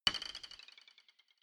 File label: drumstick hit move soft